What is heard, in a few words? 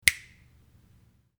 finger snap